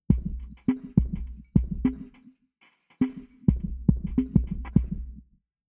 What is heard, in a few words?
drums impulse 10m centre; Pommelte